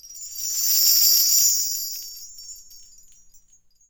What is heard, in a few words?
BELLTREE